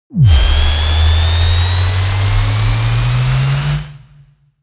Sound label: charge